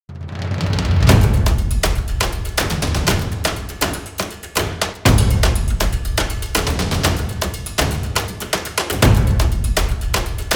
Epic Drums

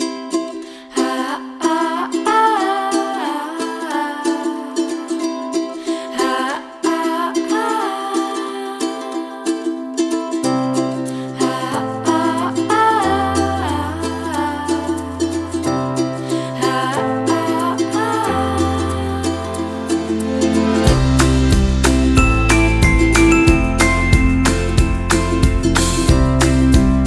Positive Ukulele

